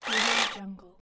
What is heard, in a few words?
electric hedge trimmer